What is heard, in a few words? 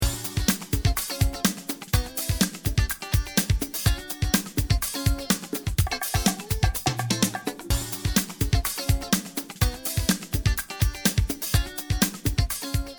Colibri Loop 125